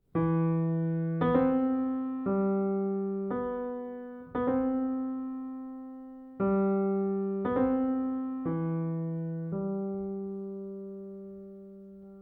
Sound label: Soprano Saxophone and Piano; Suite for Soprano Saxophone and Piano